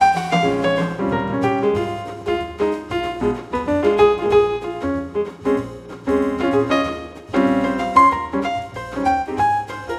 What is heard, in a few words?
Harmonic